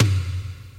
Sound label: subtle reverb tom single hit f key; tom drum samples